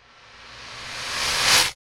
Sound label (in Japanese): syaback